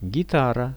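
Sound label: guitar; гитара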